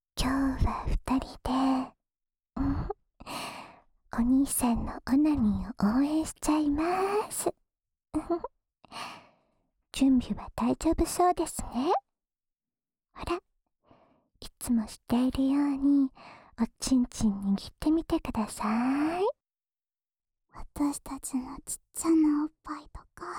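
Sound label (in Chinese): 舔耳（雙耳）; ２人的自慰支援舔耳迴圈（雙耳）（位置對調）; 耳かきリフレ『春乃撫子』へようこそ♪～ドSなロリ店長&ドSな新人キャストが、あなたのお耳に濃厚密着♪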